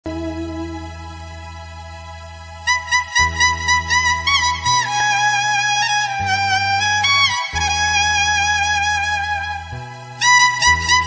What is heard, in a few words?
jay bheem ringtone; jay bhim